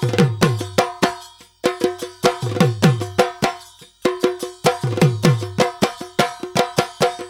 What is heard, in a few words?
Processed Dhool Loop; Gully Loops; waves of bihu vol 1